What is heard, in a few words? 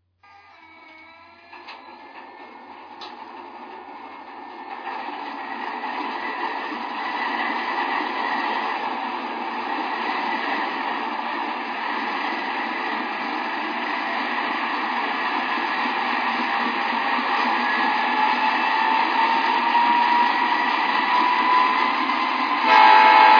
es44ac